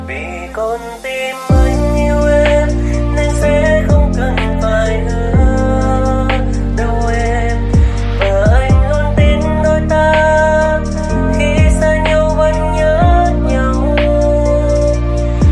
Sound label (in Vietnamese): Nhac Chuong Lofi